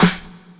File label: 1990s verby snare sound f key; snare drum samples